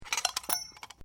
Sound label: GLASSES BROKEN FRAGMENTS DROPPED, Kitchen glasswear